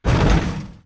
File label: bullet explosive titan shudder; Titanfall 2